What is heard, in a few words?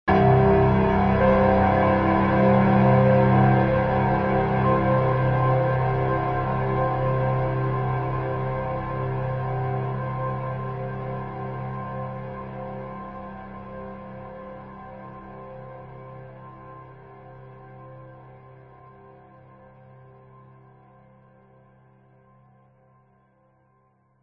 horror hit drone piano dark light subtle melody